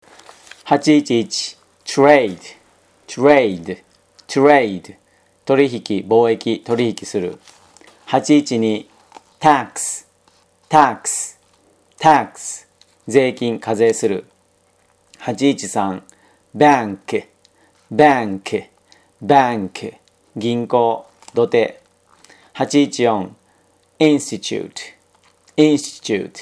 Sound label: tango